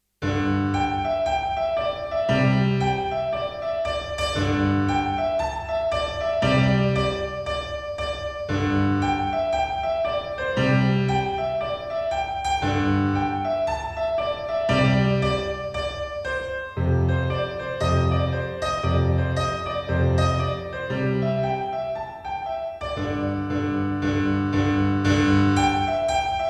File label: piano pop